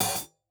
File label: hat open close